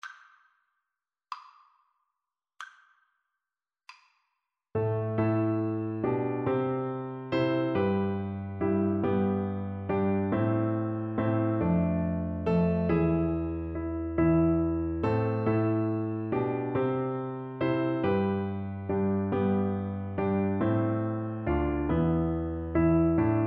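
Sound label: recorder karaoke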